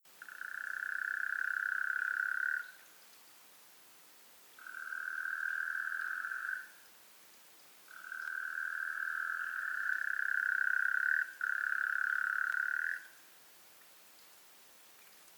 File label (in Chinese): 台北樹蛙